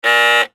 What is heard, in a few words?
vintage car buzzer; sound ideas